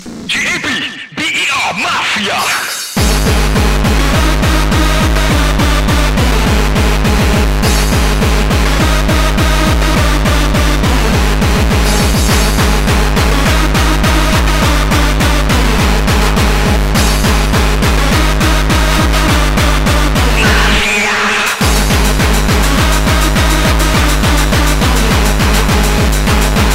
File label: Gabber